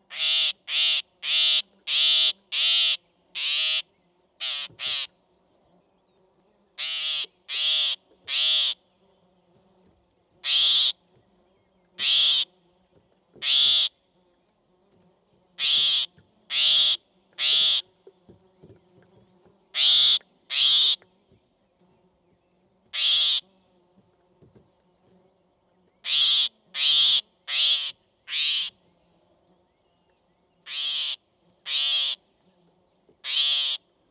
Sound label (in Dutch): Klapekster Lanius excubitor